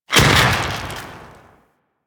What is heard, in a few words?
Inf Throwing Star Explosion, Infested; Warframe; Weapons